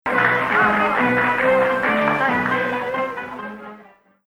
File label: Hijaz